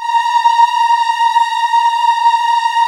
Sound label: AAH, VOCAL